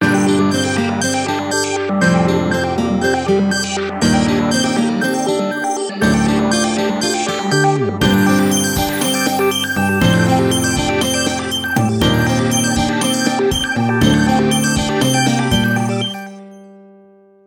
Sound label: Online Acoustic; MyPhone my96-DTV; ringtones